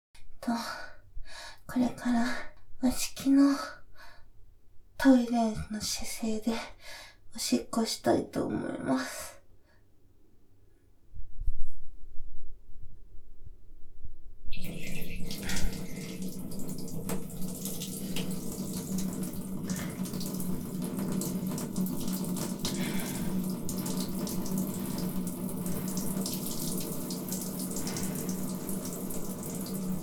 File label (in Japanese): 和式トイレのポーズで オシッコですッ メチャメチャたくさん出ちゃいましたッ